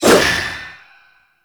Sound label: Void Dagger Heavy Whoosh; Warframe; Zariman Dagger; Void Mode